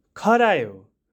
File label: rabbit; khaireko katha